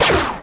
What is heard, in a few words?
Laser Wrath